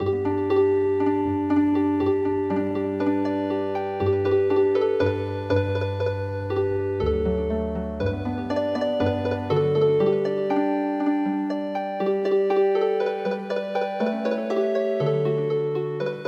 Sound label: Healing Harp